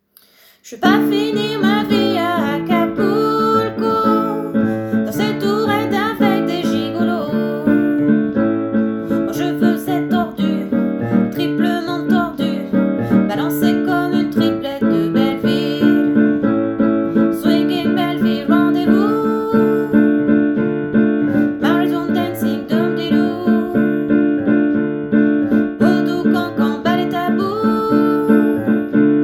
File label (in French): Enchaînement voix; Belleville